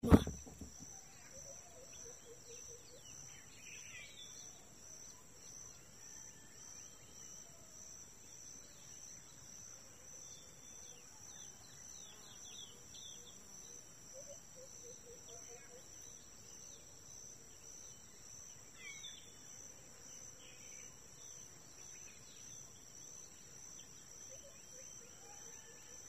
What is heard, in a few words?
In a Forest Path Early Morning Kuma Apoti Togo